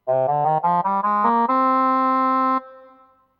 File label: horn short